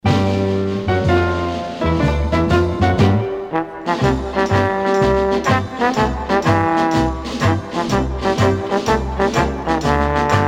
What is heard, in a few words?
Mister Democrat Instrumental